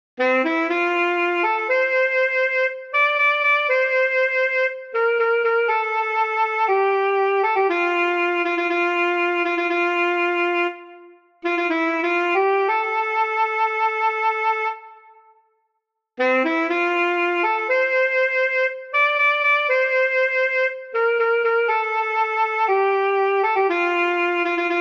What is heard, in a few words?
ALTO SAX